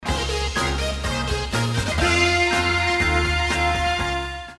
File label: Synth Motive